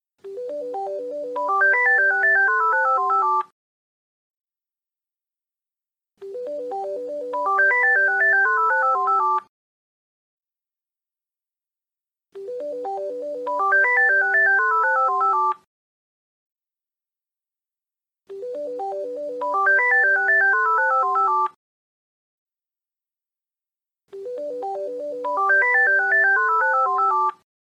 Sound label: carousel Siemens Gigaset